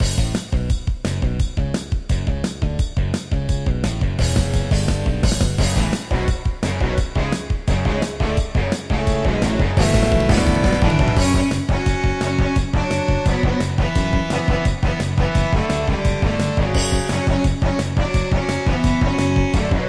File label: Yamaha SHS-500; Duke Nukem 3D